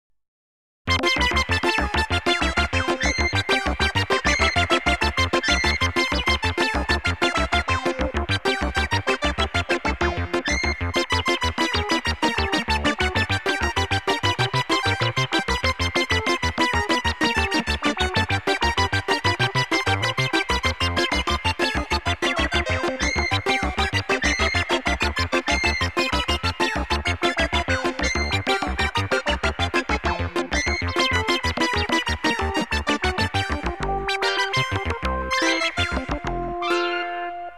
animation effects

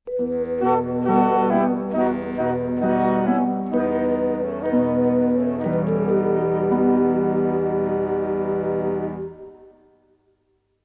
sad farewell

